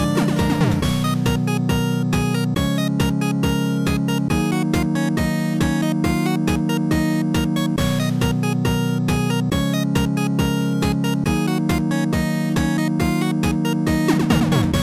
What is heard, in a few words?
8bit